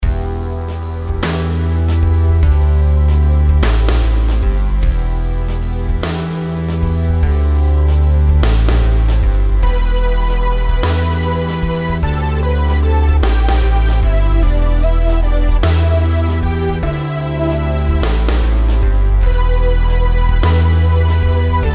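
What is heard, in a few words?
melodies compound 8s E minor; melody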